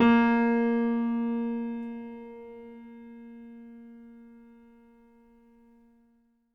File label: MIX R, Acoustic Piano, PIANO STEREO